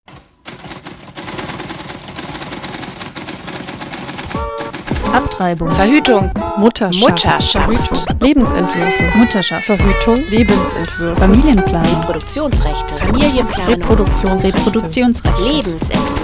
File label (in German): jingle; repro